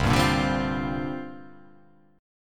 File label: C 9th Suspended 4th C down